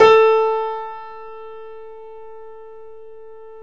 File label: PIA GRND, Roland; Sound & Vision; Gigapack I CD 2; PIA GRAND 1